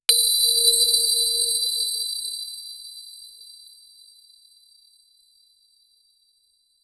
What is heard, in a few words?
short digital medal; metallic ping